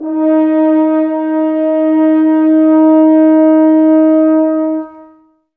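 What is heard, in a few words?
Brass French Horns, Woodwind Brass Ensemble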